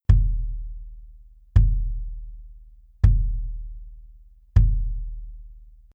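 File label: BASS Clr Soft Felt, bass drum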